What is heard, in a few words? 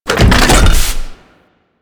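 Research Facil Crane Move Horizontal Start; Venus; Objective Rooms; Props One Offs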